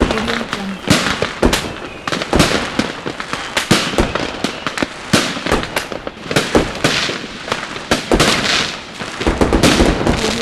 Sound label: the fireworks